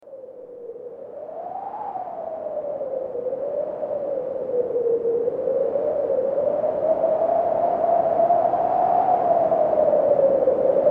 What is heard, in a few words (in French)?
vent tonnerre; leroux; athenee